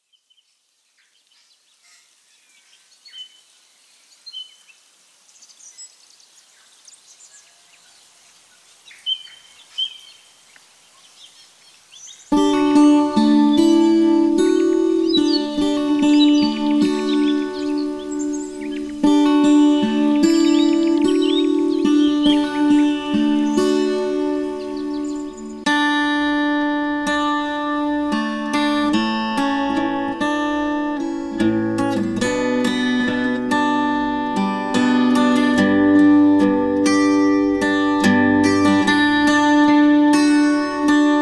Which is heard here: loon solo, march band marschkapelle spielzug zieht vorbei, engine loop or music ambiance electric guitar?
music ambiance electric guitar